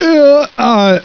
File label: pain